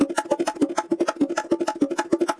Bongo

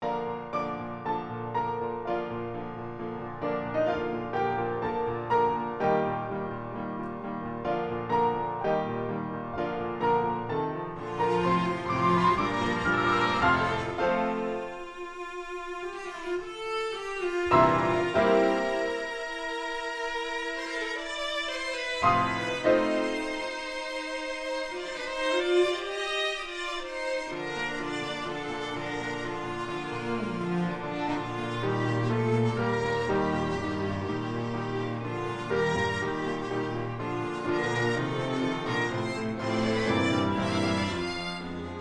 Sync Wavetable Soni

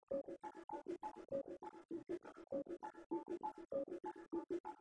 Bongo

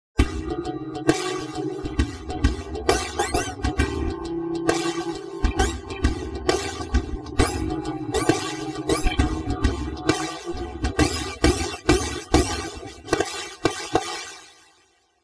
Pop

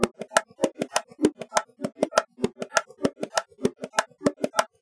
Bongo

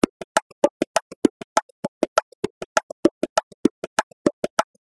Bongo

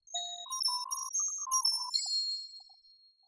Glockenspiel

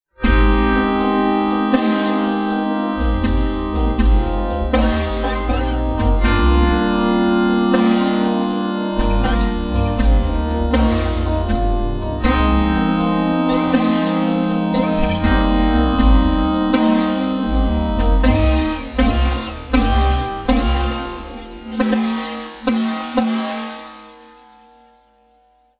Pop

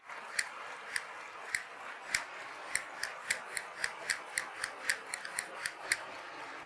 Castanets Violin Applause

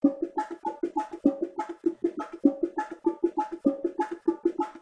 Bongo

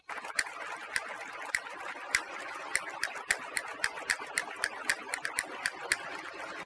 Castanets Violin Applause

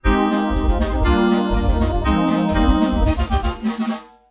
Pop

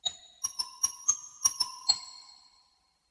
Glockenspiel

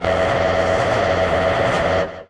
Synth Mono; TSMtoolbox

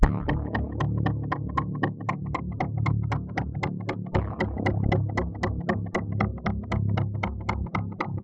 Synth Poly

